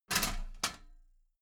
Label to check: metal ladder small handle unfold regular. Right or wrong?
Right